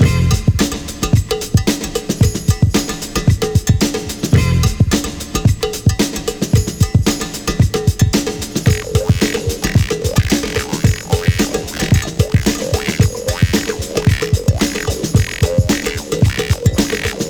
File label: Funky Music; 1975